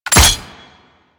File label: Ghoul Sawman Shot End, Attacks; Warframe; Grineer Ghouls